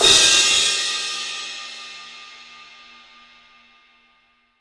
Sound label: CYMBALS; 3D Sound; 3D Drums